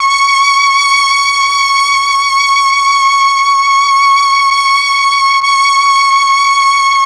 MEL STR, Legendary M400; ILIO; Propeller Island

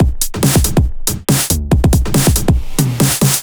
Break Beats, 140 BPM

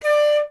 flute D; Miroslav Vitous - Solo Instruments VOL-2; Solo lnst. II

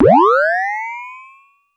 Sci fi

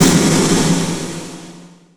Distorted Snare; Yamaha PSR E305

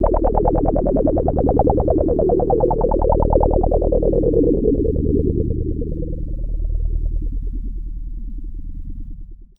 el blurps, special FX twisted

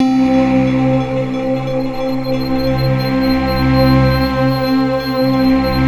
NIGHT C3, NIGHTFALL; Sound Gallery - Charged

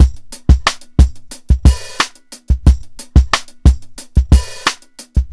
XSTICK 90, DRUMS; BASEMENT DRUMS VOL 2

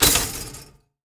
Toaster; Hits

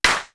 wack clap; East West - Global Sounds

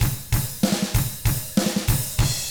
HARD ROCK, Drums; ILIO; Double Platinum Drums 1

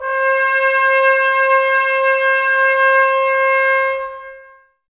BRS TBSC, Brass, Tbs Mute; Miroslav Vitous - Woodwind Brass Ensemble

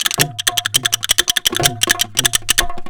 DHOLAK, Indian Traditions

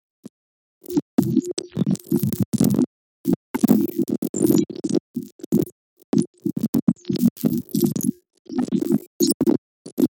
Glitch 370 bpm

